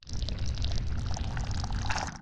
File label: Enter Ground